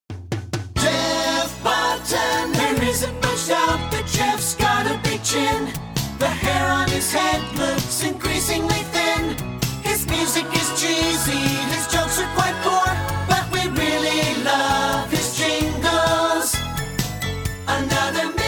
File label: Jinglebells